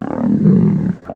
lion, attack; fauna

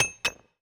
sel stone

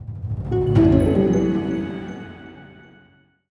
alarm research phase; interface

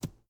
arrow dirt, attack impact

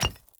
mine stone

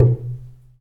alarm no idle unit; interface

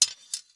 sword hit

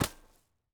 lumber tree

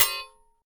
shield metal, attack impact

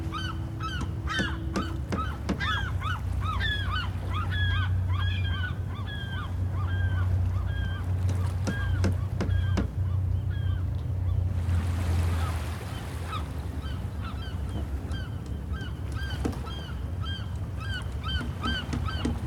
port, ambient

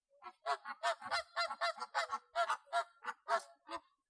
flamingo; fauna

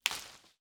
gather fruit

